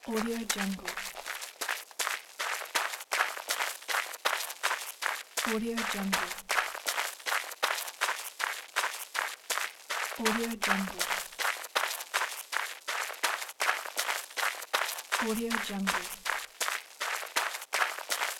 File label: footsteps running